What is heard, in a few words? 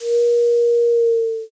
shakuhachi